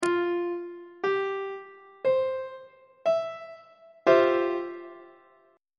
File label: f major 7th suspended 2nd chord; on treble clef